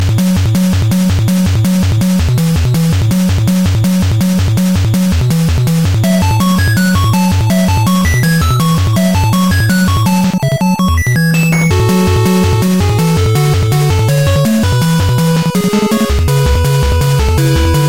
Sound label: wood; rockman7